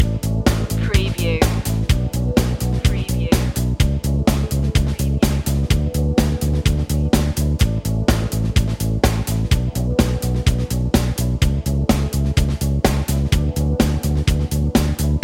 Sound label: inspiring day loop